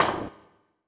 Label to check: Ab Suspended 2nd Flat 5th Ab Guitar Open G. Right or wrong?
Wrong